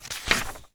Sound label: page flip, book and paper